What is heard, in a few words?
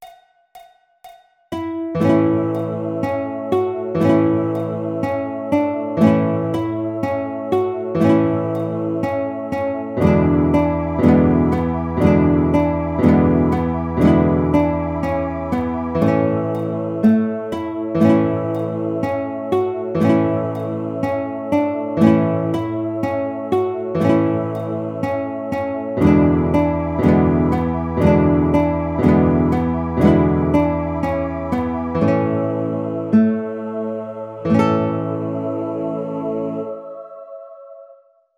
A Tisket A Tasket Guitar